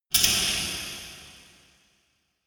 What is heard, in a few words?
dark resonator hit double hard